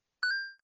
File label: droplet